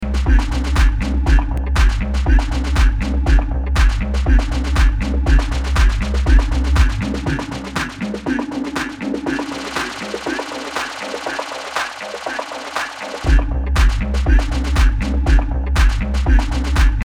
Pioneer Toraiz SP 16 Repeat Filter

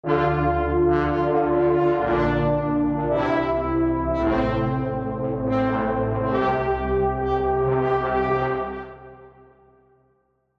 Hybrid Trailer Brass

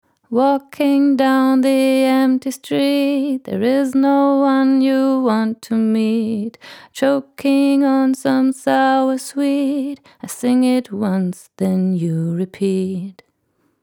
Female Vocal; Tegeler Audio Vocal Leveler 500

